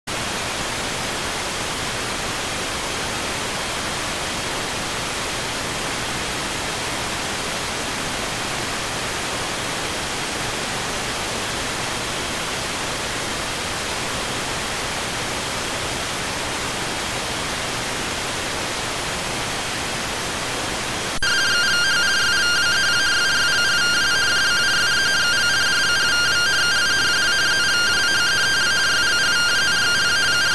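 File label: radioteletype receive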